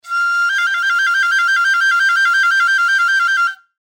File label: Piccolo Trill; Woodwind; Musical; Blastwave; Sound Effects:Musical